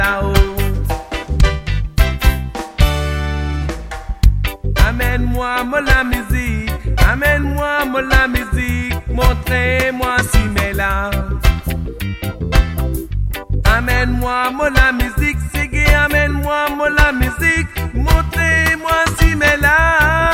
Soul Seggae

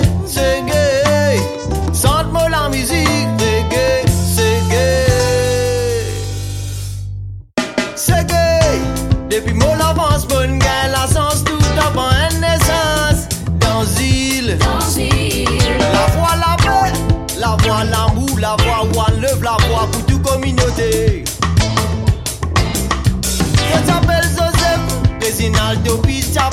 Seggae

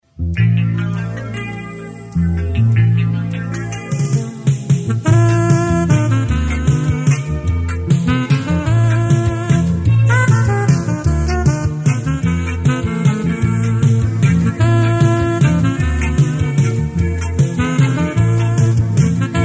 orchestral

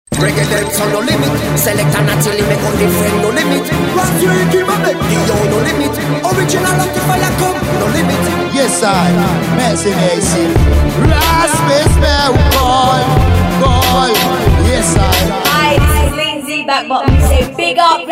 Reggae Dancehall

